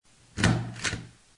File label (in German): Geräusch